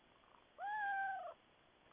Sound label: cat meow